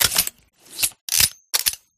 ot38 reload, guns

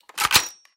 ak47 switch, guns

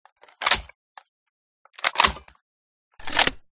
awc reload, guns

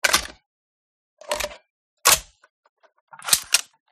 qbb97 reload, guns

